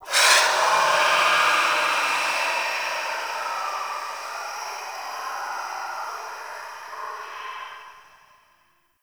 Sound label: Gas Steam Release; Warframe; Corpus Gas City